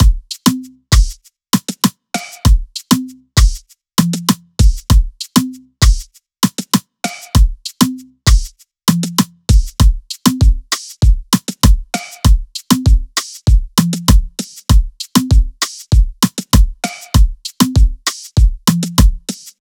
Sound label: 98BPM